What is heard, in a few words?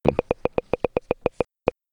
clicking sound